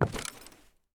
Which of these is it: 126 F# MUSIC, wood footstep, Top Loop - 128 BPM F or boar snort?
wood footstep